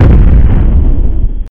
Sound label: explode; explode2